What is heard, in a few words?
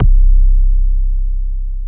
BASS R, Drum & Bass 160 BPM